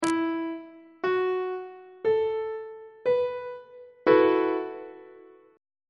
c flat dominant 7th suspended 4th 1st inversion on treble clef